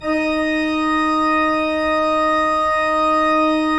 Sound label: PIPE, 3DOrgans